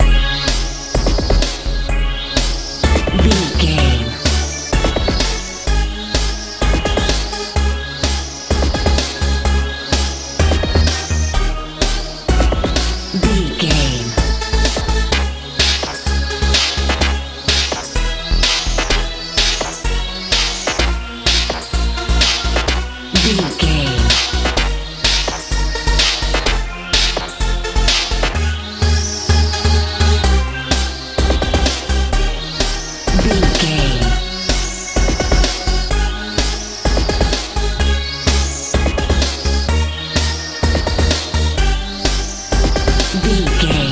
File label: good blooming house